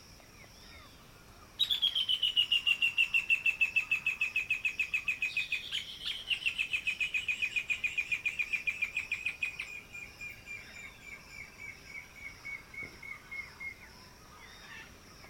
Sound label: Pale legged hornero